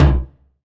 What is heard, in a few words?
DUNDUNG, AFRO PERC; EdgeSounds; Drum Mashines VOL-1